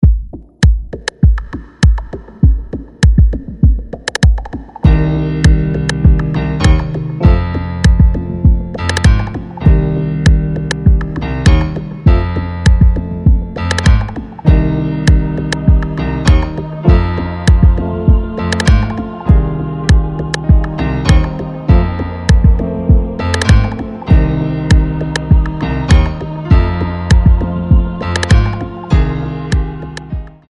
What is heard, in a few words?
big beat